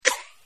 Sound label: checkbox on; Delicious Emilys True Love